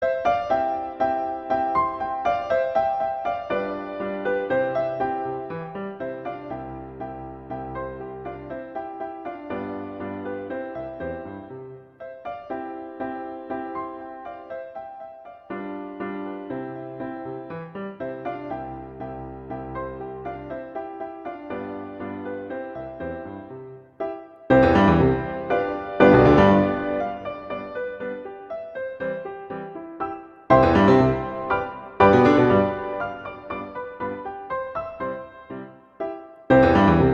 MAZURKA, Andante con moto; Clementi; Duettino

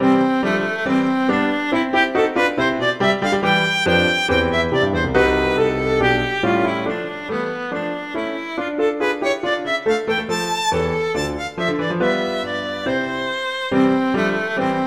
Allegro; Elgar; Melodious

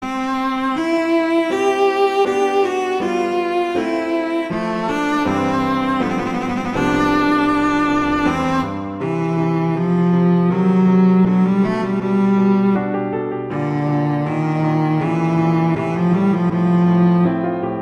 Andante grazioso; Romberg; Sonata; Op38

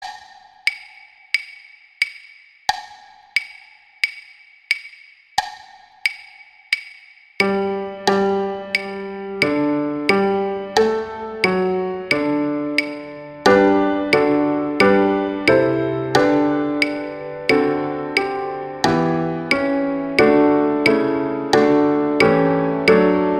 O Come All Ye Faithful - Adeste Fideles; Christmas

